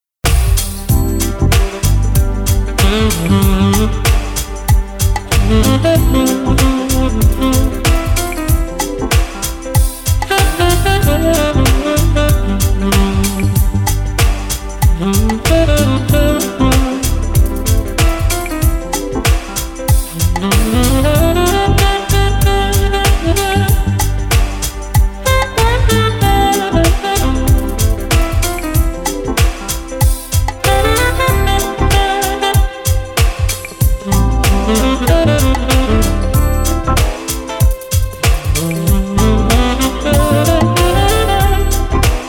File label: Chill Out Groove